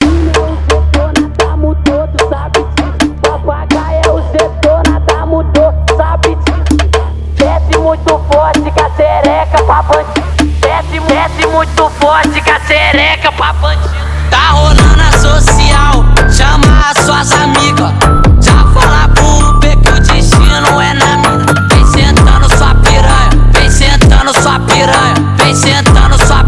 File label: brazilian funk